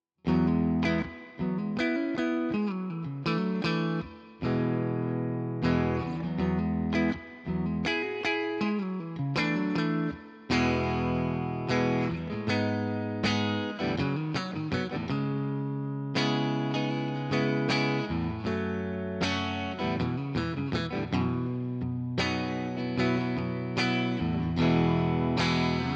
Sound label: Mid Clean, electric guitars; Gusta GTL 03i; gtl models